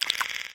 slobber, clams; infestation

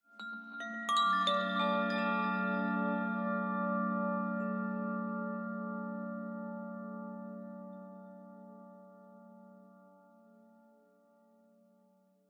ambient abstract chimes high; Half Life Model Pack

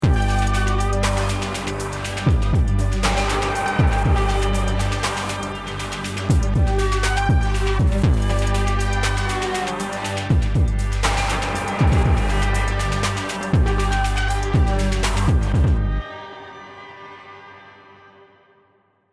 start action, music

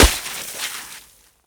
snowball hit; player; winter

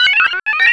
sphere blips lg; npc; Portal 2

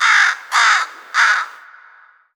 bird bird bird; Portal 2; threesies

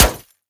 manhack, bullet impacts; physics; Half Life Model Pack

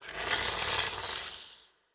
zombie scratch, brickwall

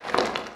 writhe, headcrab; creatures; Half Life Alyx; zoo

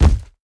hunter step; ambient; Half Life 2; Episode 2; outland

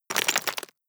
debris concrete, bullet impact debris

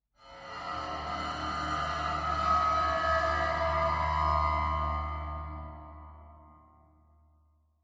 cymbal med; ambient; cymbals

